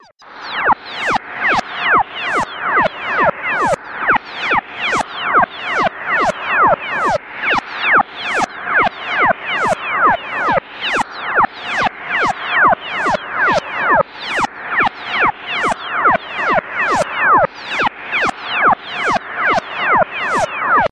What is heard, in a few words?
Laser Surgery